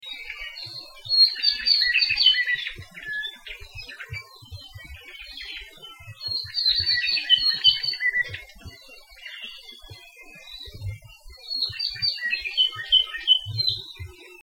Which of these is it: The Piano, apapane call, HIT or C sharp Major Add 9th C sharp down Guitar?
apapane call